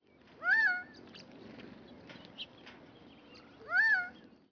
gambels quail; upland birds

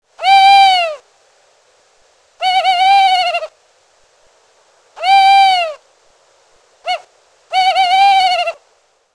Tawny Owl

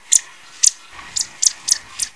chipmunk

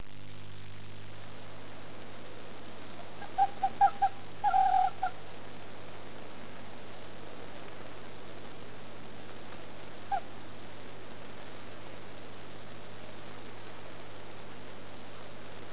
fox pur

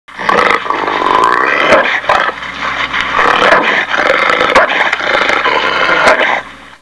Bear Growl

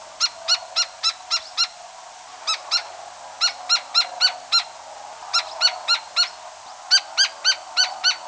gila woodpecker; wood peckers

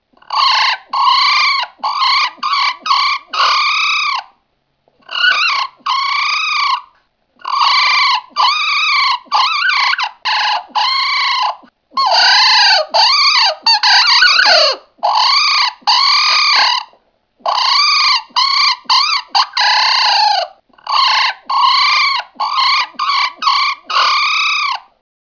cowtipbird

